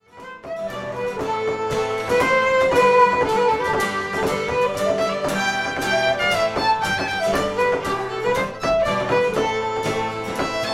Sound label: Ballydesmond Polka